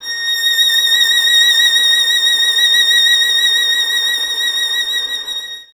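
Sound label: string violin, Solo Instruments; Miroslav Vitous - Solo Instruments VOL-2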